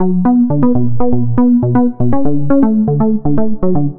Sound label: Moody Bass, Bass Foundations; Sonic Foundry (Sony Creative Software); The Electronic Music Manuscript Collection